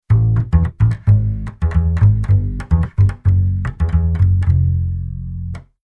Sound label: bass latin bass